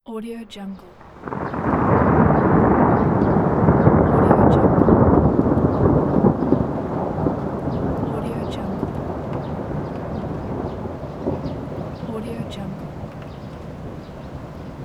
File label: thunder in the city